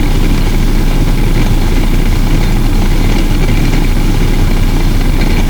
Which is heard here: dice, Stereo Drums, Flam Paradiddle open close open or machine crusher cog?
machine crusher cog